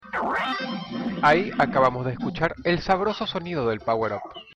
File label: powerup